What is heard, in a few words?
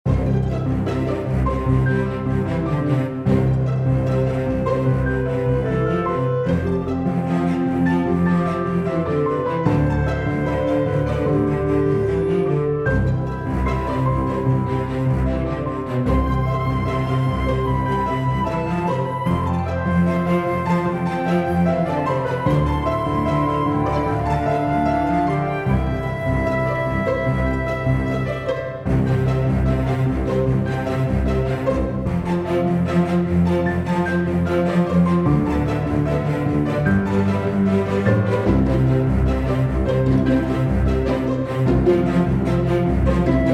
cheerful manners